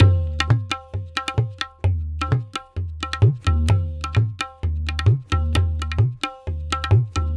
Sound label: Tabla 130 C; ethnicity